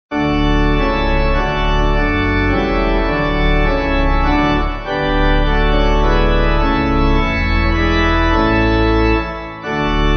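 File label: Pipe, Pipe Organ backing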